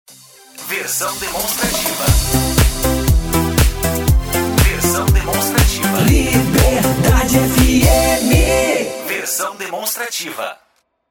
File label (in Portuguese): RADIO POP; vinhetas cantadas